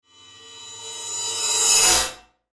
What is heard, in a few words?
Ascend Swell Reverse Cymbal; Ascend Swell Impact Cymbal Reverse; Blastwave